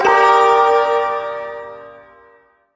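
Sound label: Shawzin Minor Chord; Warframe; Duviri Ersatz Shawzin; Chords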